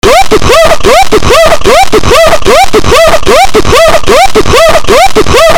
risada calvo

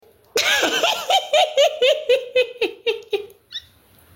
risada

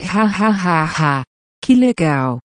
hahahaha que legal

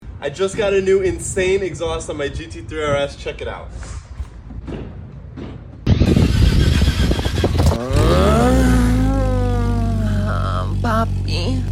barulho porsche

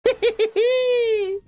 risadinha

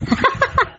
risada linda

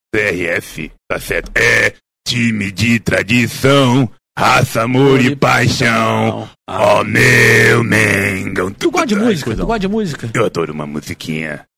musiquinha

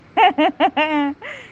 risadinha

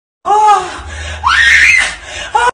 gritando

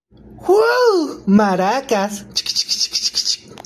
marakas

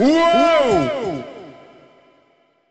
uou efeito sonoro

